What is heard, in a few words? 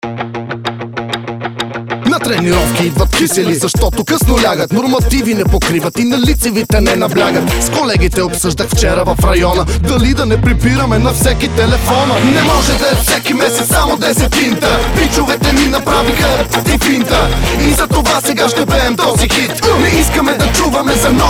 ringtone; VIVACOM Hybrid